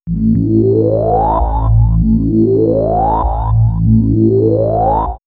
Swirls, pads; X-Static Goldmine

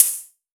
Hi Hat, One Shot Drums; Sonic Foundry (Sony Creative Software); Knutrix Drum & Bass

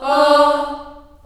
D3 child AH, choir; CHILD AHS

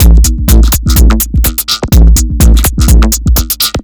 Drums Strange

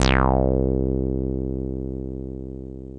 TB303 TRI, ROLAND TB-303 BASS; Rubber Chicken Software Sample Bank

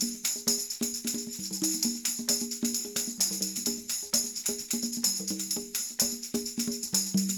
tam con; Percussion

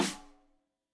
Rim Shot Stereo; Deeply Sampled Drums; SMDrum

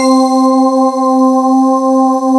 Roland D-50; HEAVEN

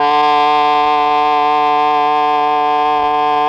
OBOE; ILIO; Propeller Island Legendary M400; CHOR+OBOE

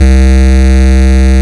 more bass C2; LIMAT LSDJ kit; LSDJ Extra Bass Notes

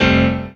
CHORDS; EastWest; Dance Mega Hip Hop Electro

